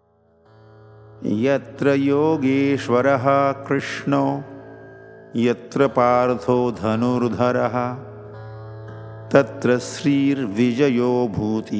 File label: Shloka tuned